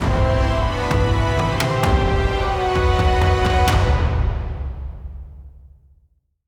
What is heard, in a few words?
Stinger; Gunslinger Kurtrus